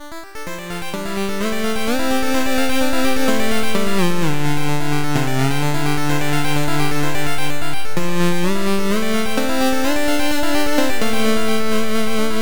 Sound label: Song Victory; Bit Dungeon III